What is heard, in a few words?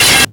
Stabs